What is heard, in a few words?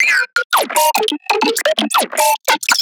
synth loop bad signal 150; Virtual Riot